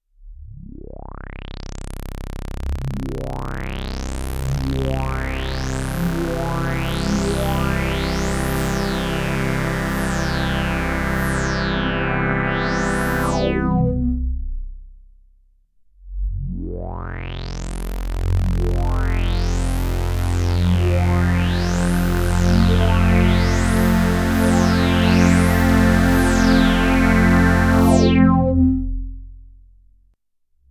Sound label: poly pads unison, p600